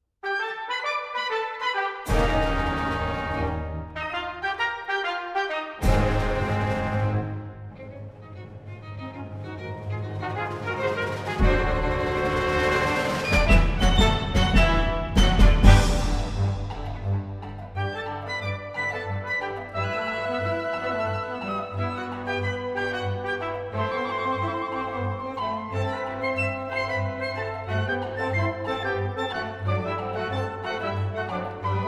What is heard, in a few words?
Western American Drama; Baron